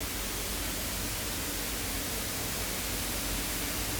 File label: Pink Air, Noises; Synthetic's Serum Collection; ESW Ear Candy Noises